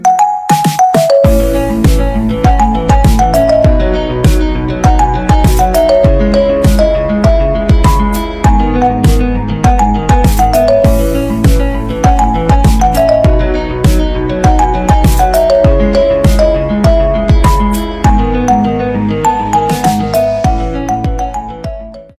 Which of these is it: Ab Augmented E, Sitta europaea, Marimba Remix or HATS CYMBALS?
Marimba Remix